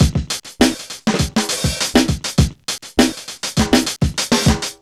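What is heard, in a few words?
101 bpm, Funky loops; Zero-G; Funky Elements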